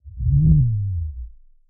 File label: woom